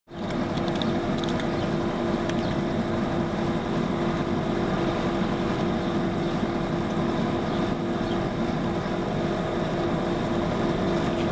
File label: Plant near harbour sheds; whitstable